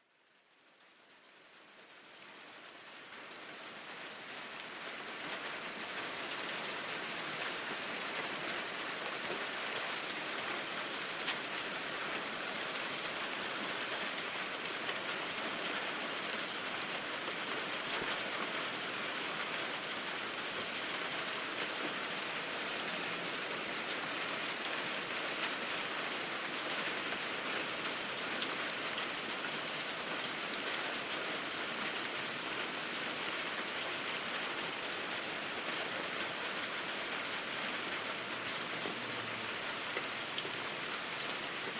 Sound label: relaxing music with rain sounds